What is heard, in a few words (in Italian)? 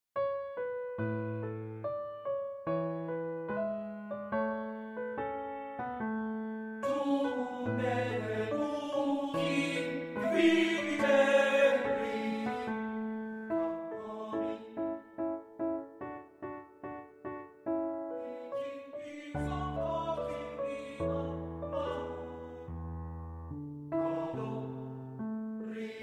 Lied per Canto e Pianoforte. Vivace.; Koehler; Der thorichte Wunsch